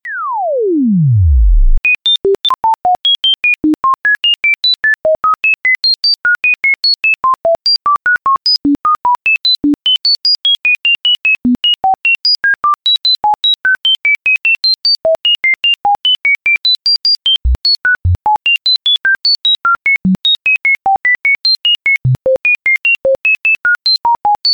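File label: cosmic ringtone cosmic sounds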